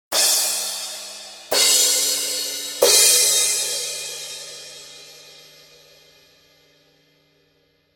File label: Paiste Crashes